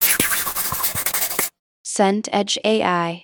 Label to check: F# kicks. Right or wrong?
Wrong